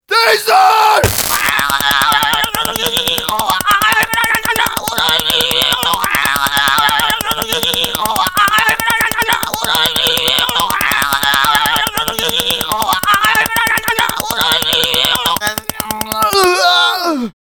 Ringtone; Payday The Game; Wolf; Taser!

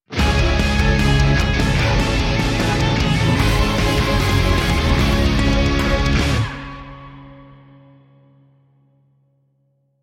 Mirror Universe Boss (Ending Sting)

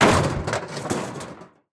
Impact

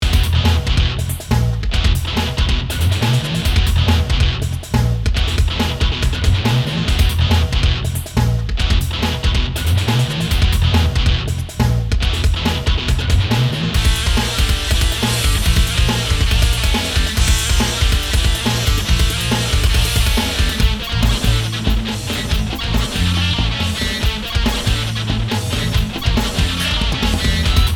BGM Music; Cabela's 4x4 Off-Road Adventure

